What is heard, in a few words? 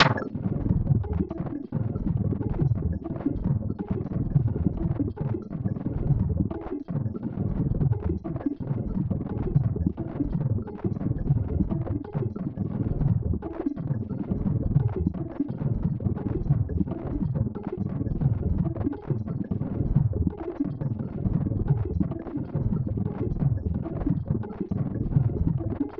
Perc. Bang; Roland BK-5